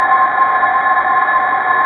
metal wind C4; Big Fish Audio; Synth City

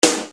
Snare Dr

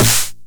909 SD Tape Sat

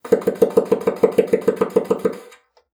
BASSOON; Xsample VOL-6

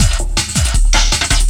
LOOP, 160 BPM, Drum & Bass

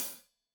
Hat Loose, Hi-Hat, Cymbals Stereo

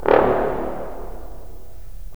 BRS, Roland LA Composer

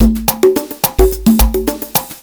OLD SCHOOL 108, LOOPS

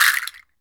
water, H20 castanet; Roland LCDP14 Africa VOL-2; Perc FX

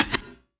Yamaha CS1x Drums

